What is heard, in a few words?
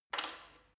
gym bicycle saddle unlock soft